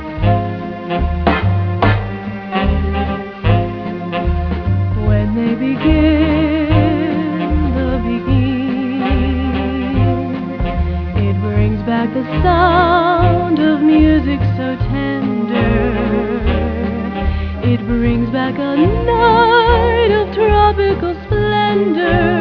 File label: beguine